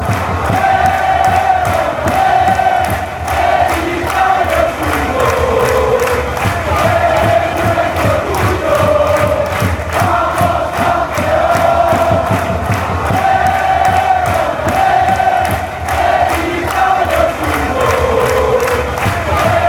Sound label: come on champ, chants